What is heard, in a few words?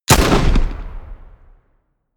shot